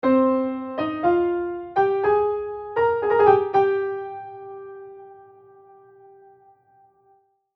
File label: key